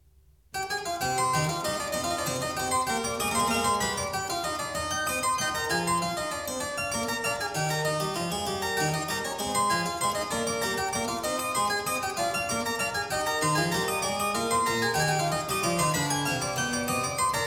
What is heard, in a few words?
C minor, Courante; French Suite No.2; BWV 813; BACH; CLAVIER WORKS; FRENCH SUITES